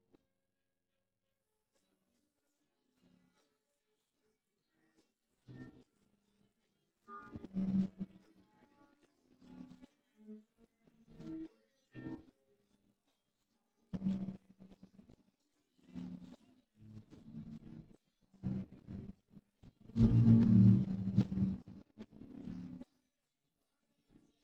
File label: guitar